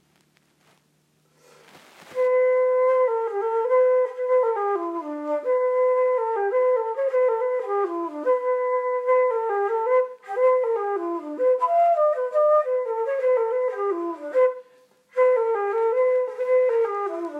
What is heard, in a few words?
pipes, flutes; craigs pipes